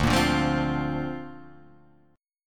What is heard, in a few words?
D Minor 7th Sharp 5th, Dm7#5 chord; strummed